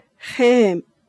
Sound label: cry